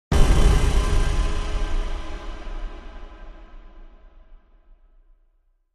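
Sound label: Impact Metallic Clang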